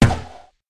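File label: distance shot bow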